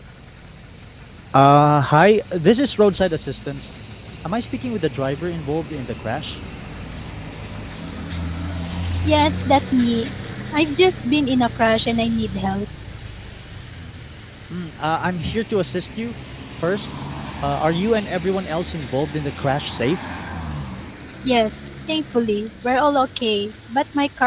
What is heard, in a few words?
Crash Roadside noisy